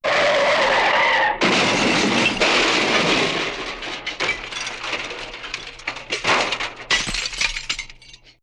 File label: Sound Effect Car crash